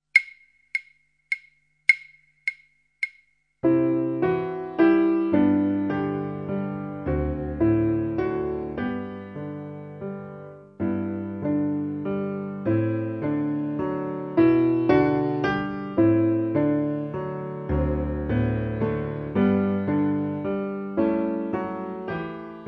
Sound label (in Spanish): Patinadoras piano